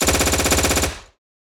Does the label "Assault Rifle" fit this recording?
Yes